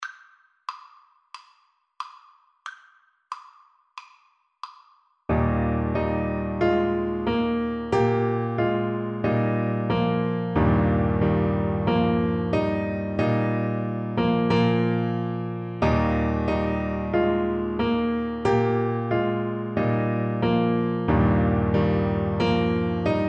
Baritone Saxophone

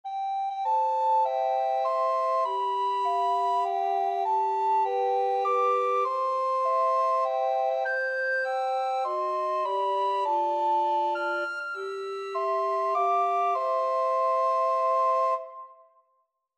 happy birthday recorder

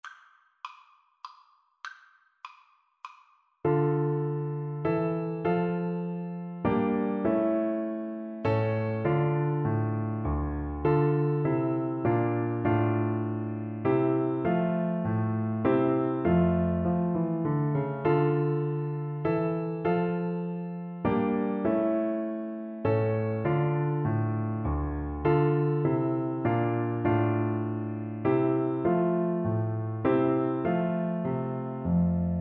bach minuet in d minor FL kar, Flute

